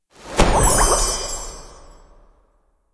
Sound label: health globe pickup; mythic-killstone